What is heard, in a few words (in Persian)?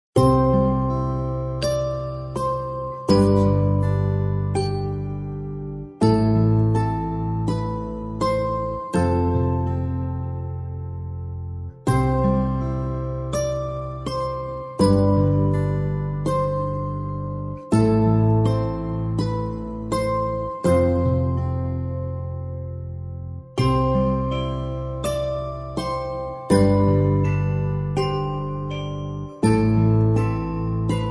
آرامش بخش, موزیک پس زمینه